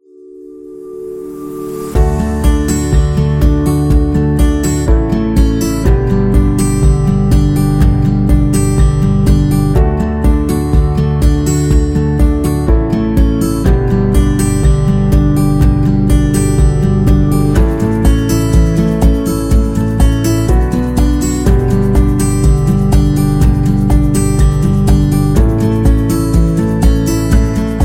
Guitar Corporate; corporate music vol 13

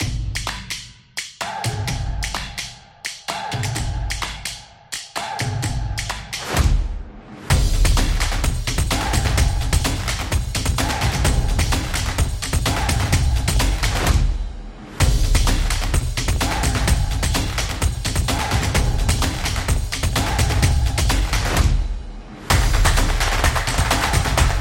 Drum Beat

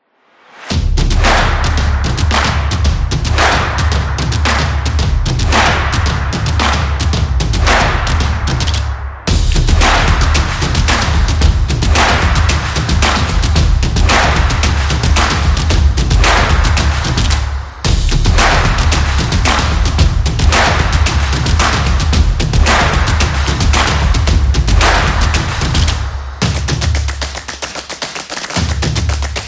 A Percussion

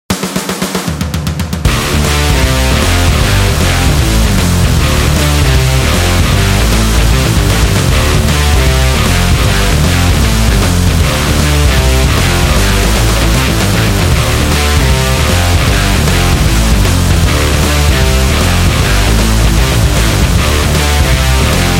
Rock Sport

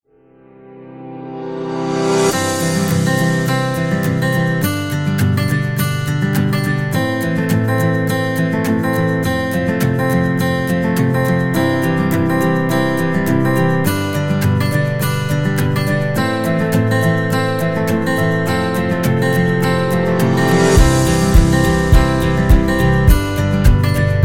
Acoustic Guitar Happy, happy music

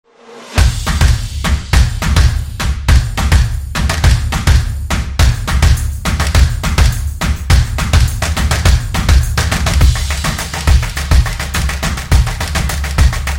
Percussion for Fashion, stomps and claps

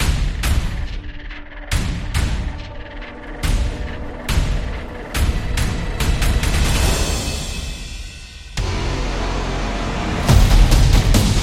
Football Drums

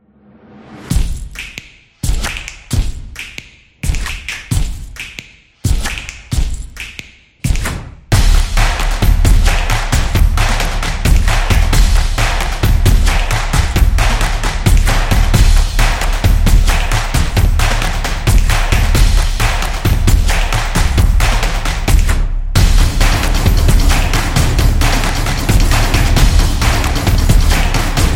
The Percussion Beat

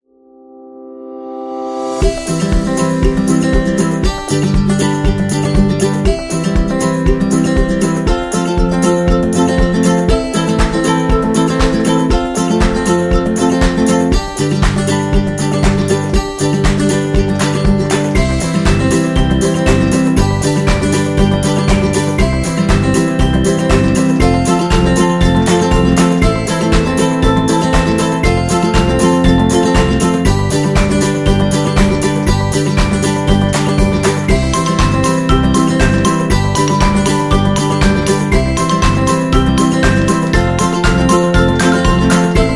Comedy Film, happy music

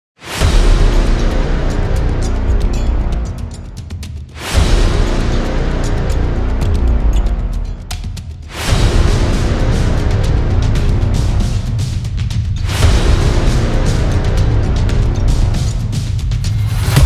Action Epic Drums Cinematic; tribe

